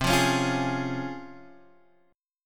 Db Major C, Db/C chord; strummed; JGuitar

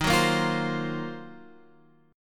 D sharp Minor 6th Add 9th D sharp, chord; D#m6add9 Chord {11 9 8 10 X 8} strummed

